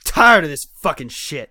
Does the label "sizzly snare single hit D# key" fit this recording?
No